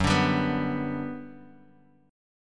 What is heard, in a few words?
G Major 7th Gb